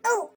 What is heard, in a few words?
Snowman hit; Phasmophobia